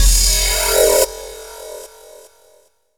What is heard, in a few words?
D+B